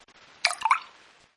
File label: Tesla Lock Sound Water Splash